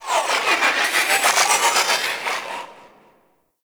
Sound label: Ship Mechanical Textures; Warframe; Corpus Ship Remaster